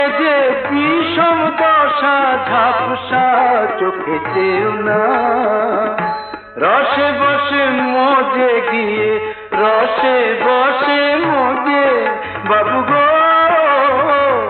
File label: bengali ringtone